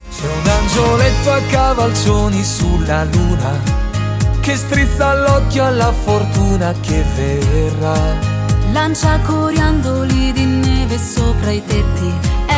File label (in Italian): feat piccolo coro